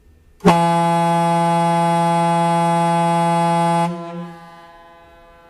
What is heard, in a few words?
Leslie A200 Marine Horn